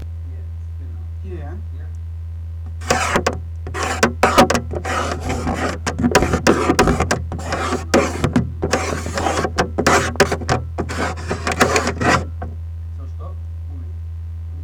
konsole plaste finger; Pistazie und Konsole

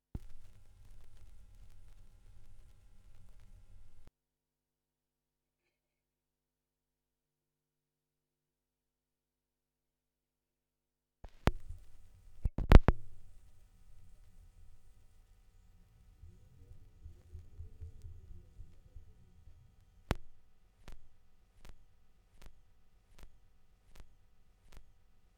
schallplattengeraeusche, Vinyl; wendelrekords 02

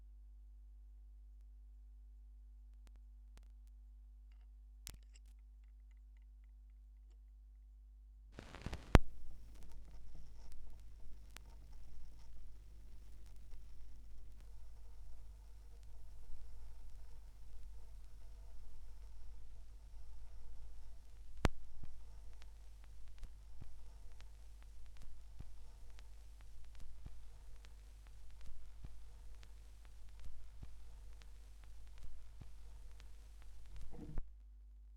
Schallplattengeraeusche; Vinylknistern

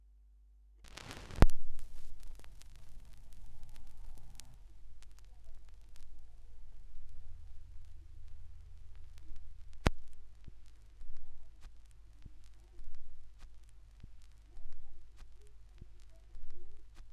schallplattengeraeusche; Vinylknistern

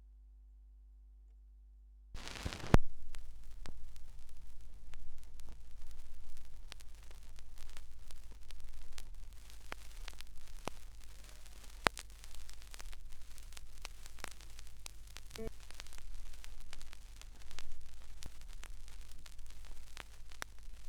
Schallplattengeraeusche

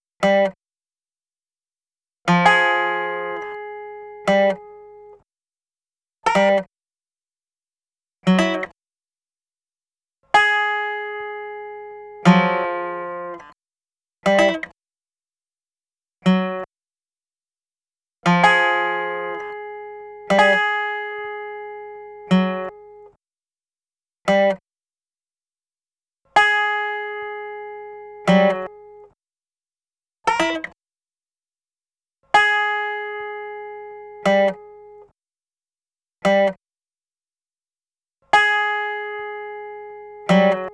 noisebeats, gitarrensamples stereo; icdiffusion; zahlenkomposition nr 3

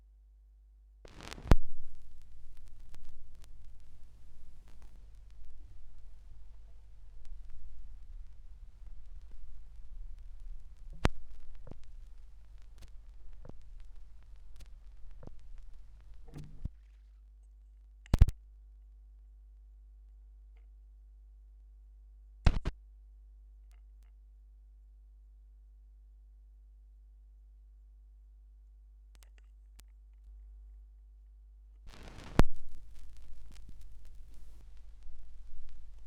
schallplattengeraeusche

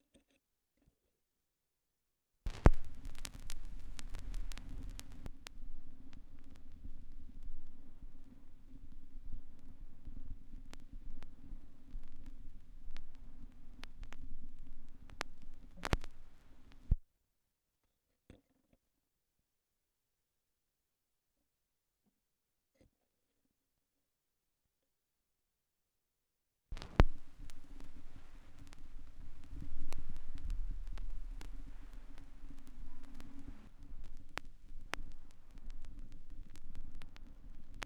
schallplattengeraeusche

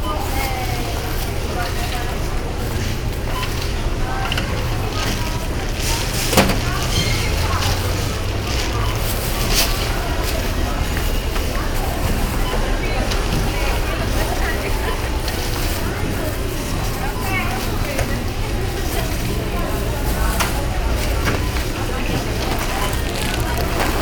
supermarkt, Brasilien

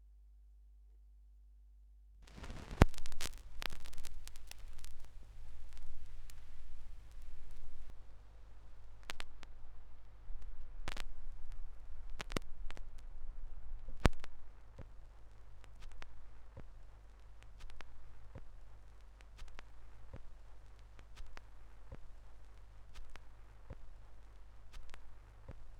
schallplattengeraeusche

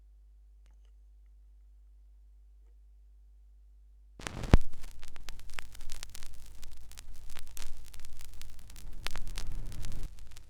schallplattengeraeusche

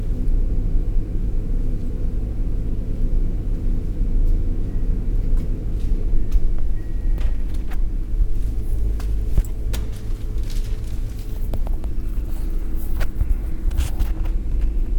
kuehlgetraet; kuehlgeraete (wendel) + verkehr

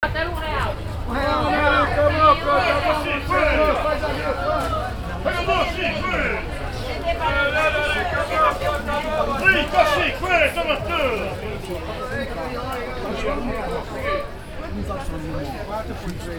aufm markt, Brasilien

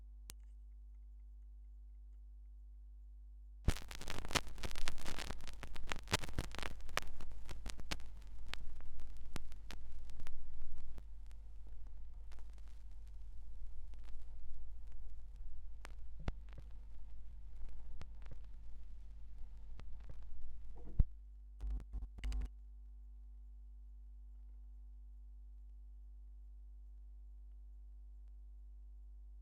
schallplattengeraeusche

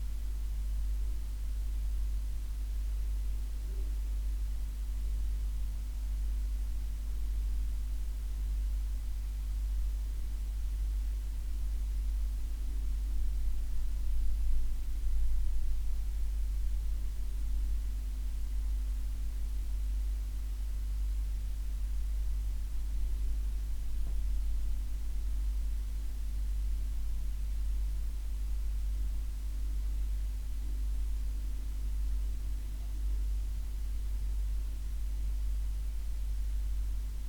kuehlgetraet zu hause; kuehlgeraet zu hause

